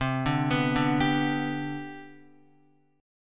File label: C Major 7th Suspended 2nd C down Guitar, chord; CM7sus2 arpeggiated; JGuitar